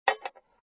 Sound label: Strainer Place; papas pastaria to go; android